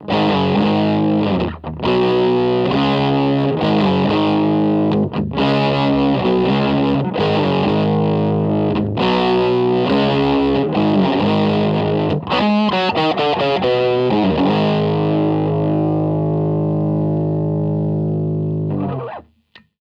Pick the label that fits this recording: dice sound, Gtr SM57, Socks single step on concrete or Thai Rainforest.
Gtr SM57